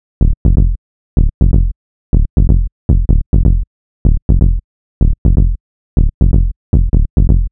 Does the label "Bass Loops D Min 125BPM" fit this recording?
Yes